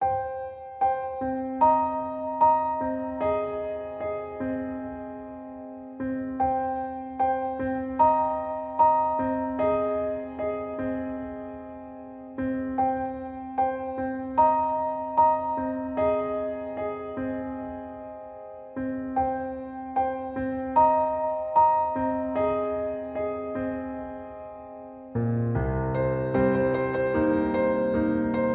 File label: Piano Cover; rock